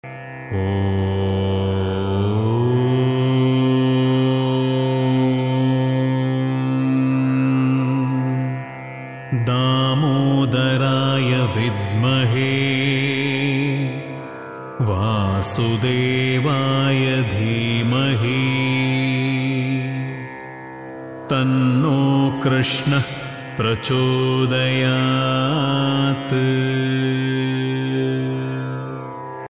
shri krishna gayatri

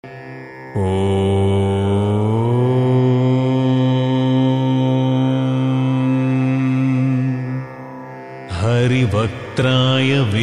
nandi gayatri

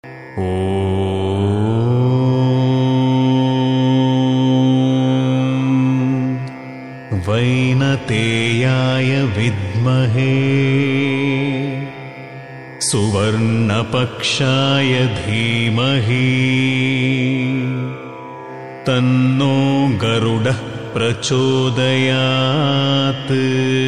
garuda gayatri

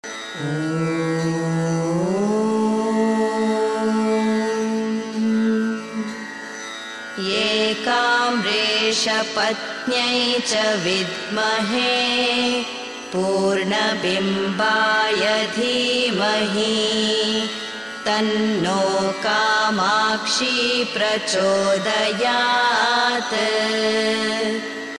kamakshi gayatri

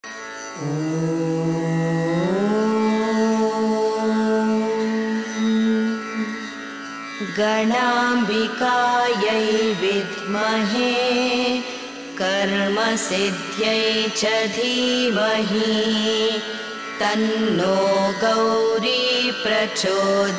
gauri gayatri female voice

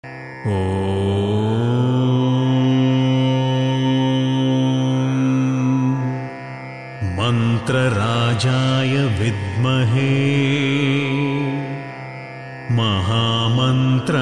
mantra gayatri

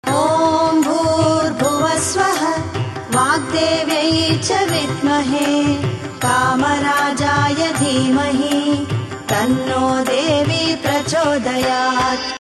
saraswati sharada gayatri

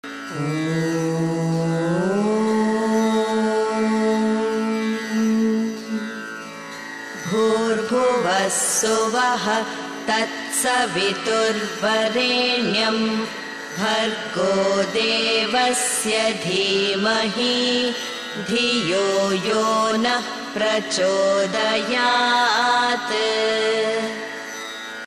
gayatri (savitri) mantra female voice

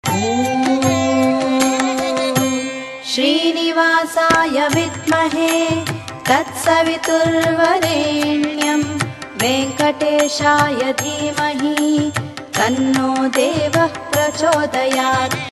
shrinivasa venkateshwara gayatri

